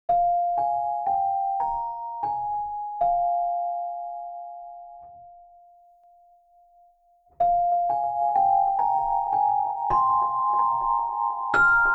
Vintage Oil Can Echo, Both heads and lower modulation create the feeling of a lullaby.; Dry and Wet mixed in Pro Tools; Dream / Thyme; Olivera